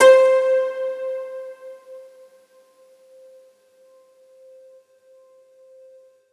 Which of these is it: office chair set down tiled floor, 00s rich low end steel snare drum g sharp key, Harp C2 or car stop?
Harp C2